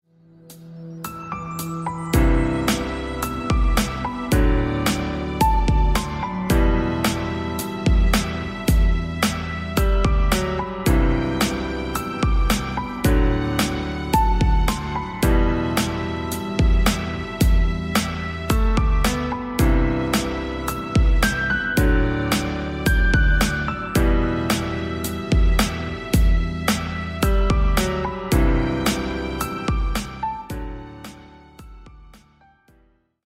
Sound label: instrumental Ringtone